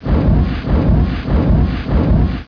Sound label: WIND GEN